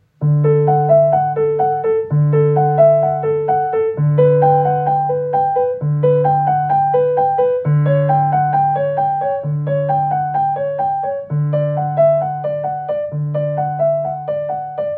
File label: Prelude No1 from Cello Suite; Bach